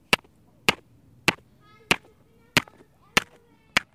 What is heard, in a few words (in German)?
Steine schlagen aufeinander